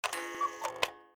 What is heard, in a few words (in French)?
Coucou horloge faible; mirumiru